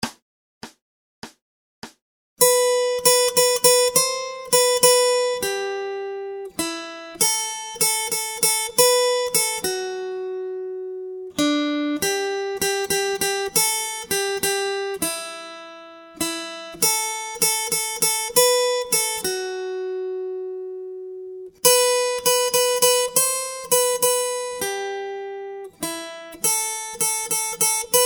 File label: Acoustic Accompaniment, acoustic guitar